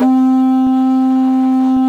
C3 HORN, Didgeridoo; Big Fish Audio; DIDGEHORN1

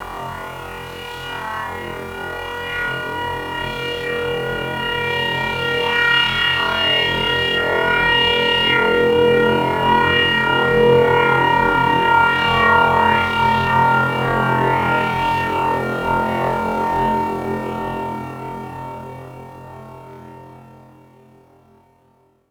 FX, Drum & Bass

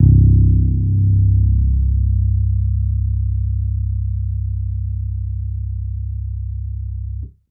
String Bass Electric c1 med; TrashLibrary

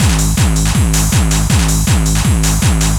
LOOP, Eurotech; Sounds Good

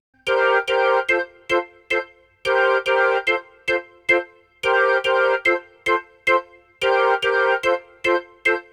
Rotor Riff, Organ; Sonic Foundry (Sony Creative Software); Joe Vitale Organ Donor

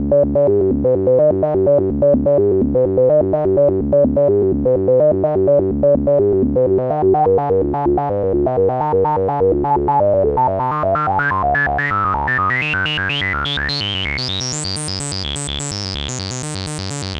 ARAB C# 126, SYNTH SEQ, Trance; AMG; Global Trance Mission VOL-2

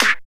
SD, Drum & Bass, 116 BPM; Ueberschall; Drum & Bass Flash Trackz

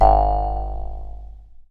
jews harp; Voice Crystal - Steve Reid Definitive Percussion

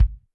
KICK, Drums Percussion; Kick 1 ModuIe

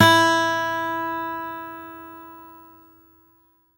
HRD PICK; Quantum Leap; Guitar & Bass

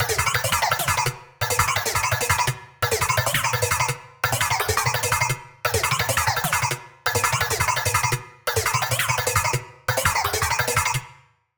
techy bass

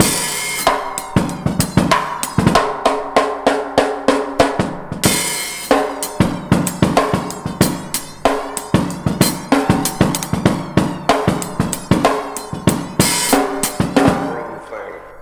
ONE HAND, 99 bpm, Breaks; POTBreaks

